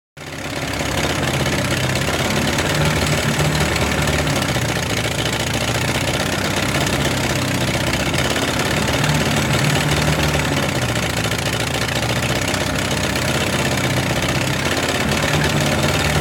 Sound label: Natural Gas Rig, Industry, Natural, Gas, Rig, Generator, Hum; Hand Tools; BlastWave